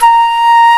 flute A#4; E-MU Formula 4000 Series Vol. 5; Protozoa; Proteus2 Presets

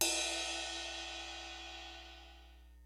LIVE AC KIT, DRUMS; Kid Nepro; Elite

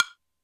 Md Block, Alesis D4 drum machine

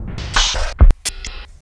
KITCHEN; USB Soundscan vol.33; AKAI; Disjointed Textures; RYTHME

